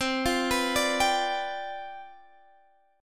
C Major 7th Suspended 2nd Suspended 4th, C, down, Guitar, Half Step Down